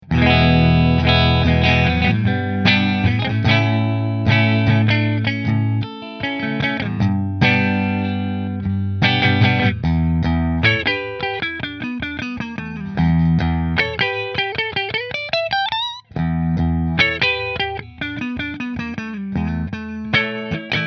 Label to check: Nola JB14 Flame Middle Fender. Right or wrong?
Right